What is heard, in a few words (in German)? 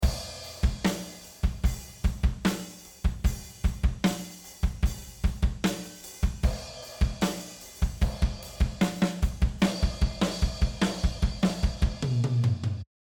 Schlagzeug Neve1073